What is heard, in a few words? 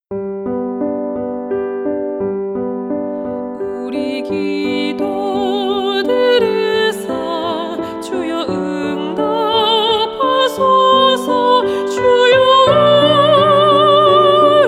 SOPRANO